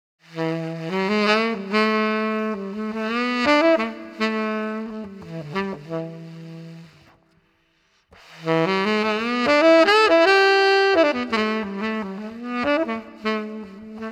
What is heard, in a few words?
Tenor Sax Smokey 80